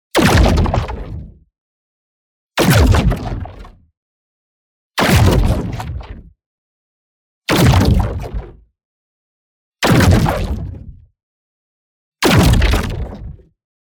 EXPLOSION SMALL Burst Shockwave; boom library; Matter

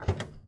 Ferrari Testarosa Door Open; Automobiles

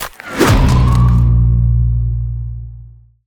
Ice Spell Whoosh, Cold Whooshes; Blizzard Surge; Arctic Aura

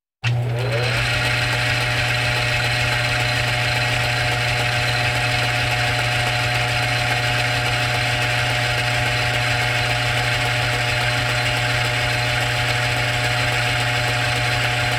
Walking Machinery Trucks

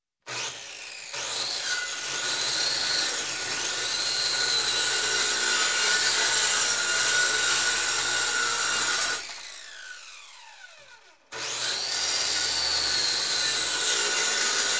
Construction, Saw Electric Saw Start, Cut Wood; Sound Ideas 2000 Series

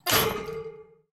bridge gate closed; DeadFright

